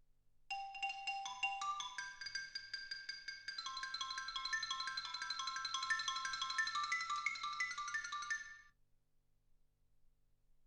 xylophone

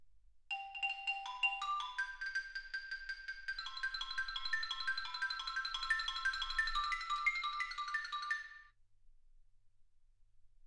xylophone

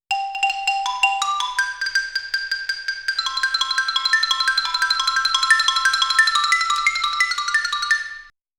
xylophone; OmniPower4296

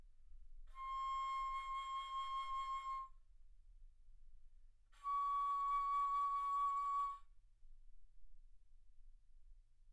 Bass Flute ff C6 Db6

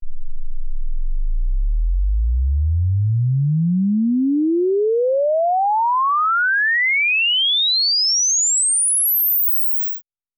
exp swept sinus 10Hz 24kHz; OmniSource

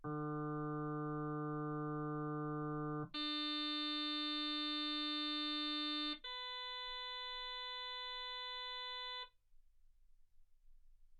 harm sinus 48kHz

